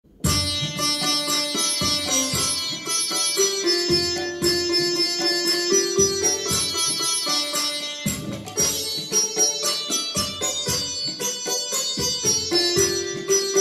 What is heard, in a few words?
devotional ringtone; Sai Baba; jai sai ram